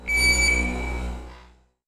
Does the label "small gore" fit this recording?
No